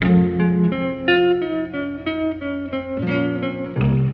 guitar mexican; Loops

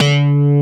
zung, Bass

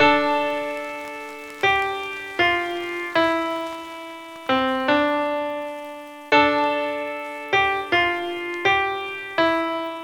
piano sad, Loops

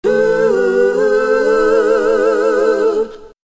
"hoo" group sung; ooh ahh; ho hoo woo

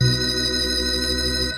church pipe organ, Synths; Notes

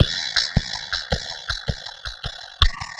pedal, Drum loops

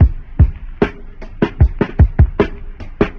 old record, Drum loops Boombap

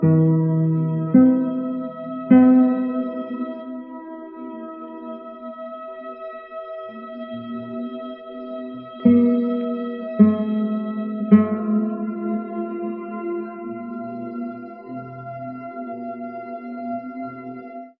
guitar and strings, Loops, Sad

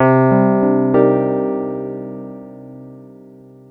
piano, Loops Sad

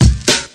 speaker kick, zaps and beeps

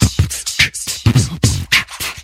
Beatbox

